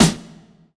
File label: sparkly snare sound e key